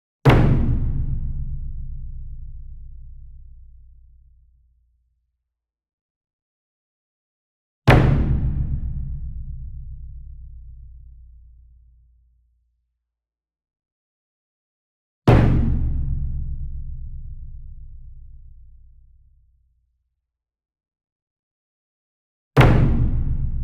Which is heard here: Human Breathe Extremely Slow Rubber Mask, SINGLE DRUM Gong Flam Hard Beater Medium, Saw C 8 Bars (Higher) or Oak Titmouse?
SINGLE DRUM Gong Flam Hard Beater Medium